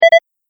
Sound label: Collect Item Pick Up Sound, video game sound effects